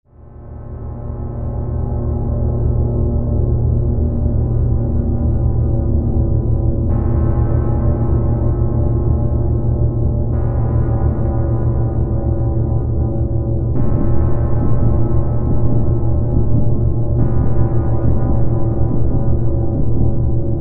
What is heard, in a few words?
Metal Atmosphere; cry of fear